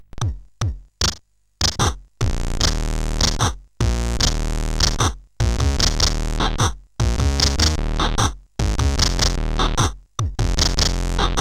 Electro, sid CSG8580R5

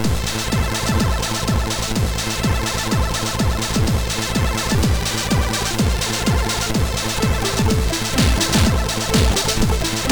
64, sid MOS6581R3

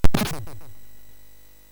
SFX, MOS6581; Narco Police; hvsc

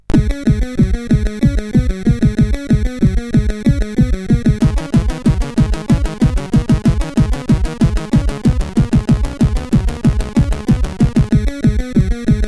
sid, CSG8580R5; Zool

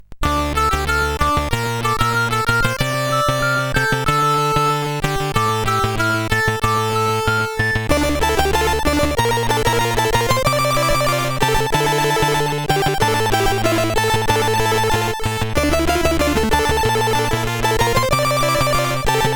Intro sid CSG8580R5

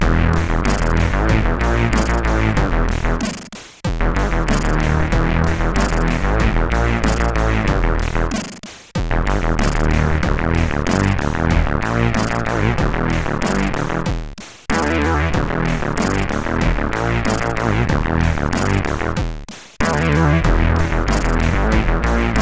heavy riff, CSG8580R5; hvsc

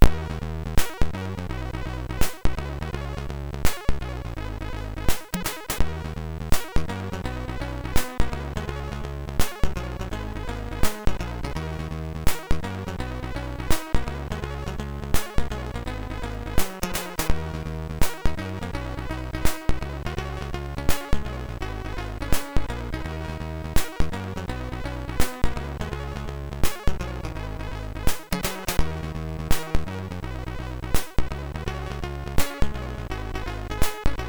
sid, MOS6581R3; Walking By; Rorschach

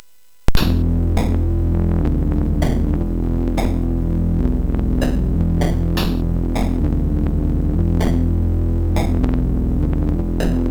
sid MOS6581R4, GAMES; Aussie Games

